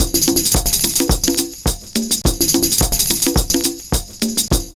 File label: DRUM SECTION; Univers Sons